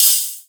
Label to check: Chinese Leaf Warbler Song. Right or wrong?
Wrong